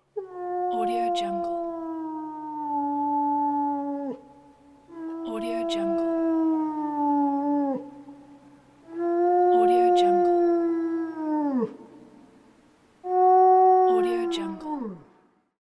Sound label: howling wolf